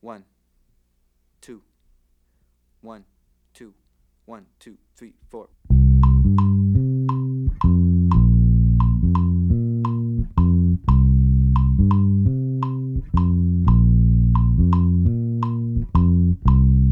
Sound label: bass mambo and son montuno tumbao on two chords